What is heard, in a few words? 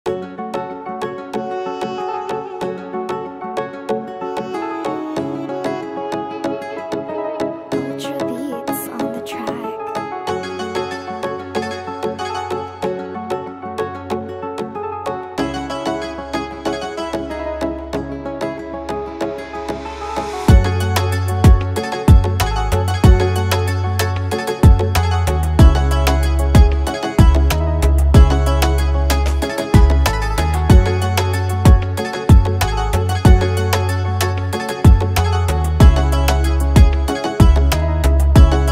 oriental dancehall type beat instrumental